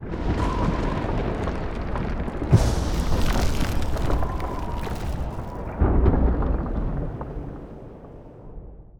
plasma trails; Stellaris; extreme frontiers

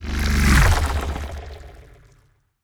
ship strikecraft move; biogenesis

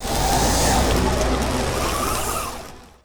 spaceport building complete; Stellaris

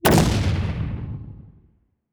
titan muzzle energy explosion, weapons; kaiju; Stellaris; biogenesis

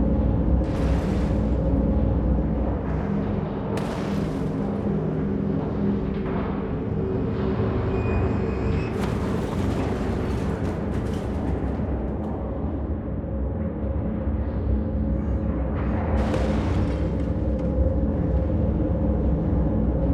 fc ship preftl slaver broken idle; Stellaris; first contact; ships

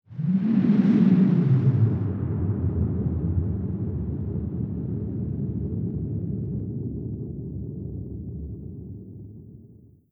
falling star; Stellaris

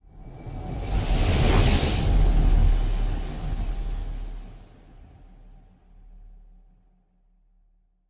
ship colony ship move; Stellaris; machineage

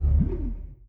ftl hyperlane in coming ship; ambient; Stellaris

